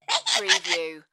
Evil Doll Laugh

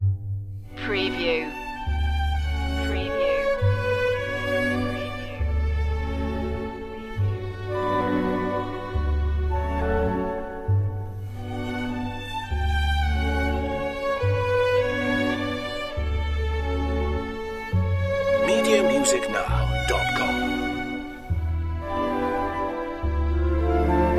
strings solo; gimnopedie

